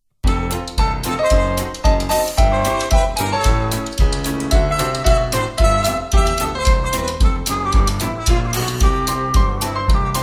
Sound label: Instrumental